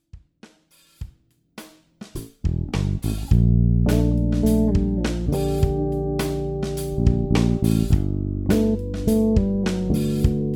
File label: Blazer Bridge PU, bass